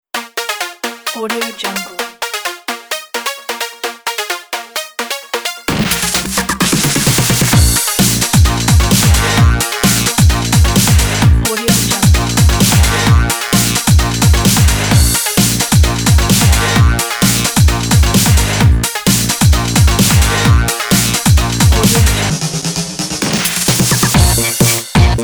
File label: breakbeat